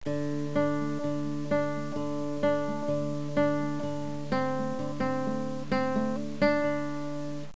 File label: loop; Music Toys; kbot